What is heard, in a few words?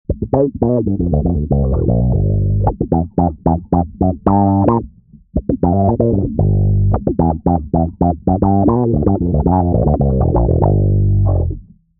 Quad Cortex bass; Foog